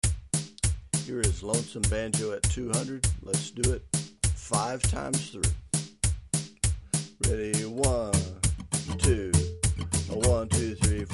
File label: lonesome banjo